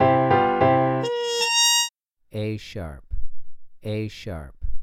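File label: Muted Trumpet 2 Note Melody Major a#3 a#4; ear training; melodic ear training for musicians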